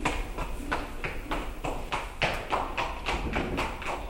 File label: horse gallp stone